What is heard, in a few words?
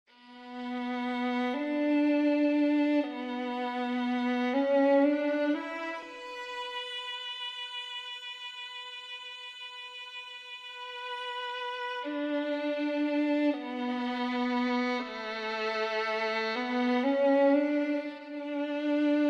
Violin 2